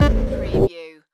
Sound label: Hi-tech Panel Servo Button